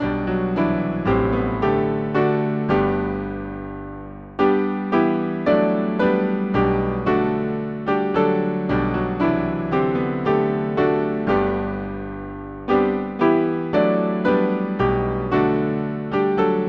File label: Hymn, piano; Hail to the Lords Annointed; Es Flog Ein Kleins Walvogelein